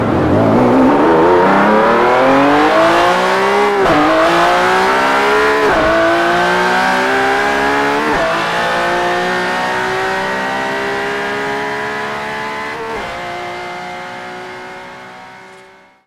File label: Ferrari 599xx